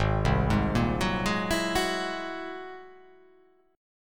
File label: G sharp Augmented 9th G sharp